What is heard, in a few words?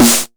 Snare; Takebeat; Club; VEC1